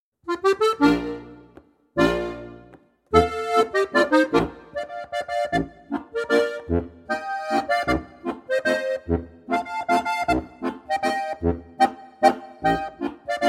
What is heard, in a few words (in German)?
landler